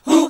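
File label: Gospel; Best Service Gigapack 1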